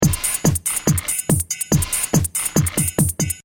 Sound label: Techno Trance Drum Loop 142 Bpm; Drum Loops; Royalty Free Drum Loops